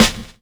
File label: SD CRCK, Korg EMX-1